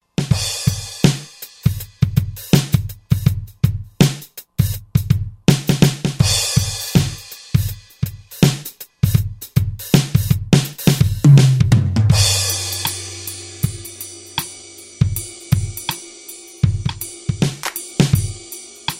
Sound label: Jazz Drum Set 2, Roland JV-2080; Rhythm